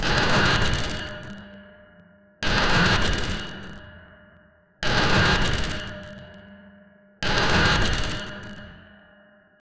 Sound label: robotic footfall thuds, hall reverb